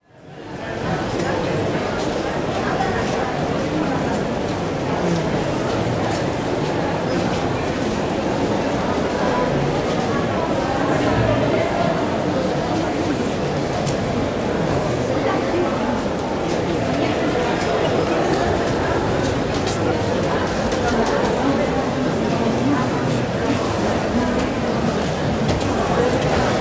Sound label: Station Busy Transit, Walla; Sound Ideas; Walla Series